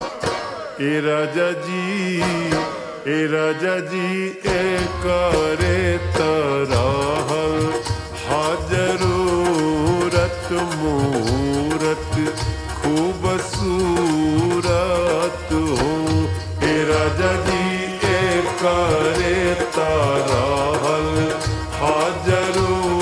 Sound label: Ringtone Slowed Reverb